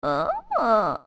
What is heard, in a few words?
Old Lady Thankful Oooh